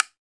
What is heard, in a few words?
guiro; NorthStar - Global Instruments VOL-2; Guiros